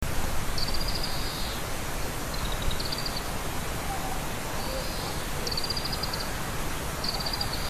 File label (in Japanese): kawarahiwa, bird; カワラヒワ （河原鶸）; 社務所下駐車場の上の電線 チチチチチッの鳴き声; 蘭梅山; 野鳥